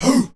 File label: "hoo" group of men, voice; ooh ahh; ho hoo woo